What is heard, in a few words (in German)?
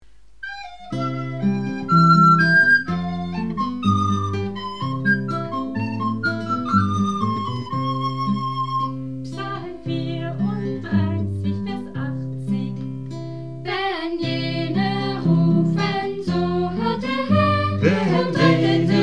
Psalm Kanon 2 Stimmen; bibellieder